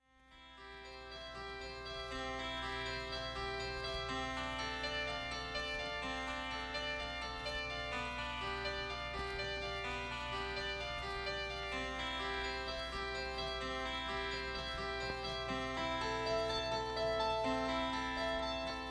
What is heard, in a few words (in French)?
bach clavier bien tempere en do majeur